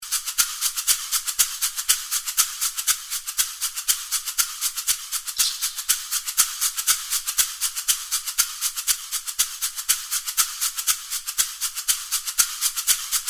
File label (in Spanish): Maracas, garifunas